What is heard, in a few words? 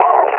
pain, combine officer; Half Life Alyx; Half Life Model Pack